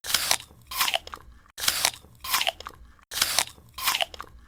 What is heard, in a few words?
eat apples